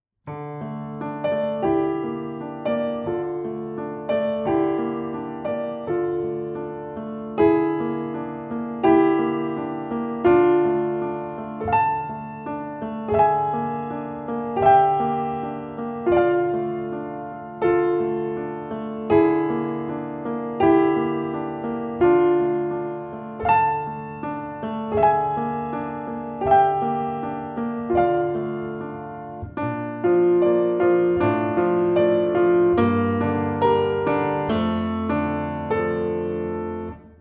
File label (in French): instrumentale